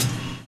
live kick; Univers Sons - Kitch 70s

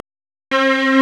STRNG; CMP - Analogon

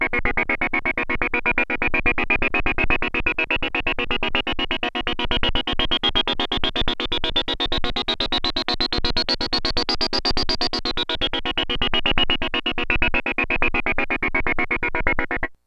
125, SYNTH SEQ; AMG; Global Trance Mission VOL-2

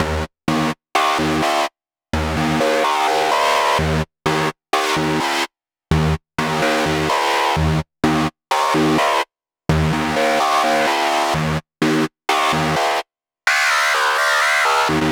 Synths, Underground UK House & Electro; Sonic Foundry (Sony Creative Software)

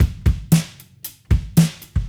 Cruisin' Disco Drums, 70s Analog Funk; Sonic Foundry (Sony Creative Software); What it Is!

